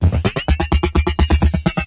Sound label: electro phase, DRUM LOOPS and BREAKS, FILLS and ROLLS